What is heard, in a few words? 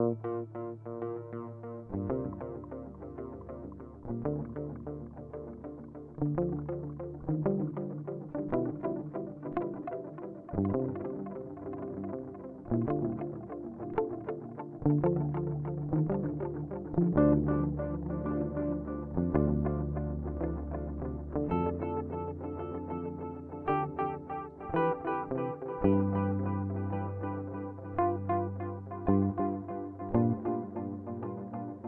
Timeline Stereo Dual; Strymon